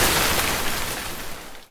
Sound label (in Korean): [Hit] 물 떨어저유; 音MAD; 少女终末旅行; 소녀 종말 여행 음원팩